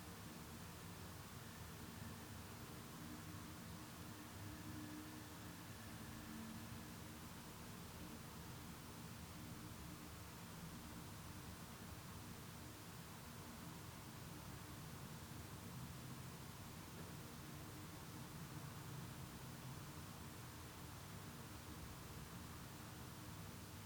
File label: indoor small room